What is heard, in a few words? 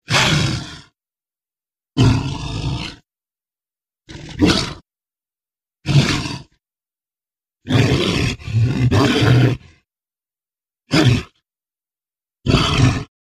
Monster Type 1 Snarl